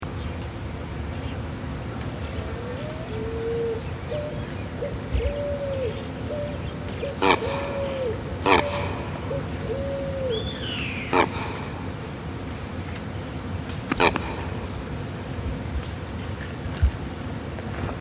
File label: Biguá, Neotropic Cormorant, Phalacrocorax olivaceus; cantos; PELECANIFORMES; Phalacrocoracidae